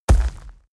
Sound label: hellhound step; schritte